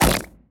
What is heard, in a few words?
poop throw